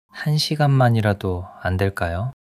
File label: struggle